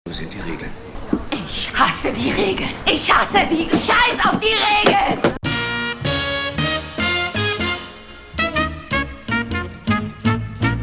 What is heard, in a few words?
Jingle